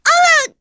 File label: Vox Damage; kingsraid; en